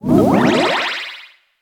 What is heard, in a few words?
collecting orb - sound, Retro Game SFX